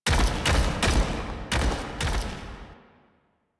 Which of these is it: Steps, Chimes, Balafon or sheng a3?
Steps